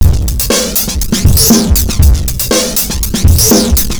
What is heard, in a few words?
R&B Drums